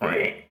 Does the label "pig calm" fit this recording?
Yes